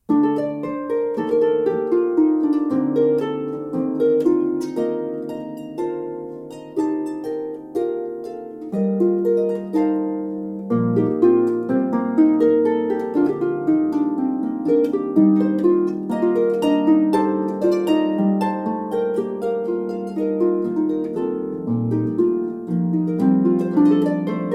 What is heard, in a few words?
Sonata for Solo Harp